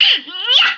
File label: vox attack mmm yoh